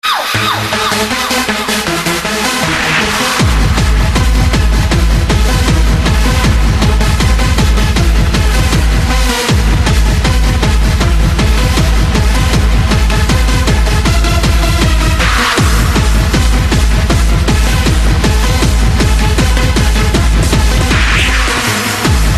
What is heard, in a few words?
hardstyle